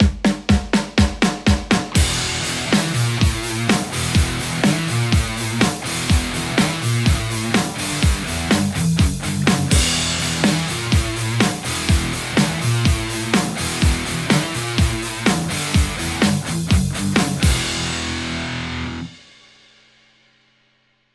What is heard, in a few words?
les paul